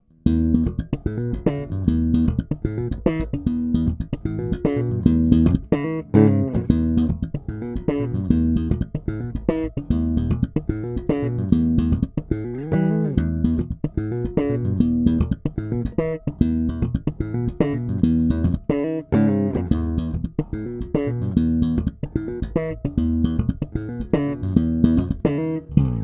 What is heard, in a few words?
Both Slap